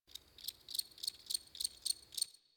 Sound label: Insect Day; Warframe; Ambience; Eidolon Plains; Eidolon Landscape; One Offs; Insects